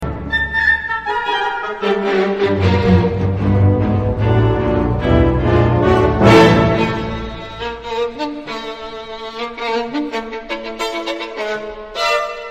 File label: bell concerto violin